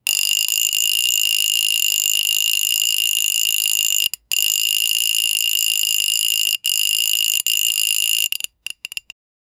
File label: the sound of an old, fishing